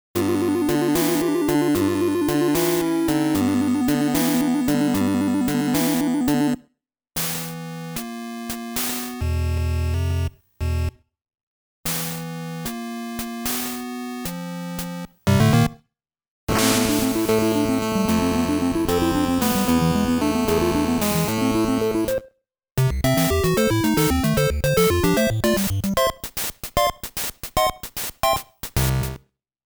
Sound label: Game B Start ~ Jingle ~ Game C Start ~ YOU LOSE ~ Game Over; Game Sound Museum Famicom; Kousenshou Series; Wild Gunman; Duck Hunt; Hogan's Alley